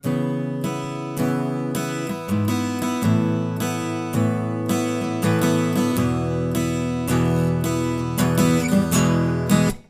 Ac Gtr Trans

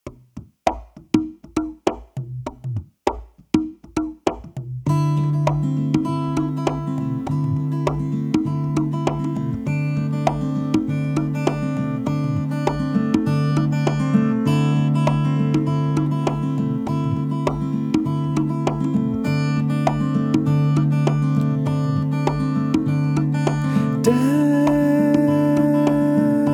No Fairy Dust

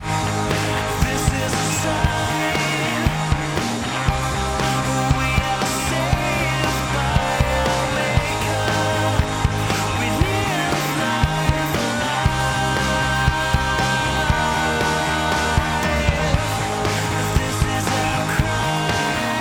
Motion Pad In

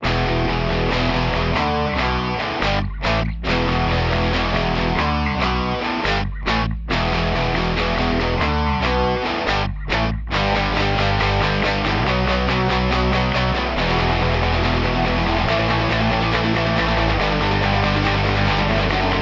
Gtr Bass Sub Mix

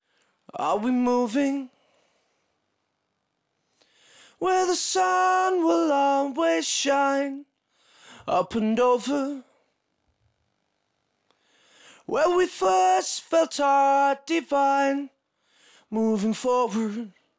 Vocal Verse

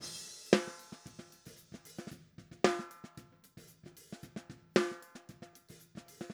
Snare Minimum Phase

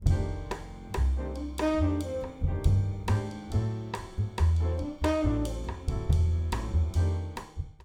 Bass Mic DI Meld Inv

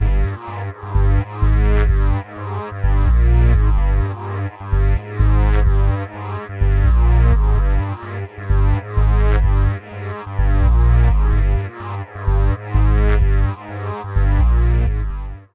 Bass Synth Sub Rhythm

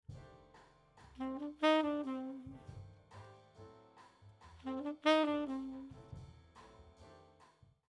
Sax Raw

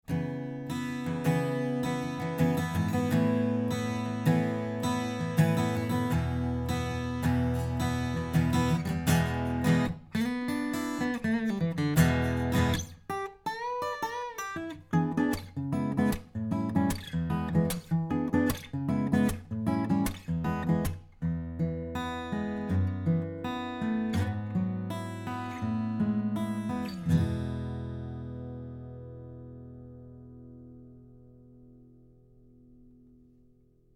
Ac Gtr Shoot Gtr C414BULS

